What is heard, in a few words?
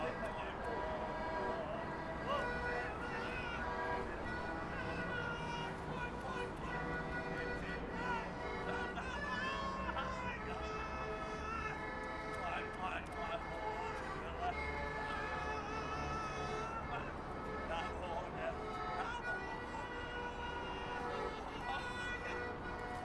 Moscow Shouting Busker; street musicians